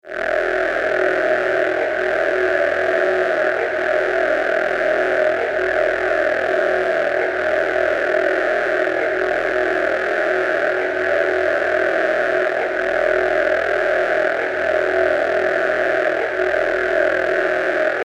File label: Groove